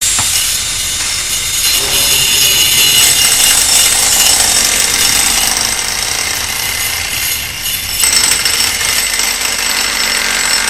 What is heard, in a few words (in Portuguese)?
barulho de obra